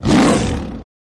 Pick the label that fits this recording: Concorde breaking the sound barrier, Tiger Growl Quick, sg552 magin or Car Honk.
Tiger Growl Quick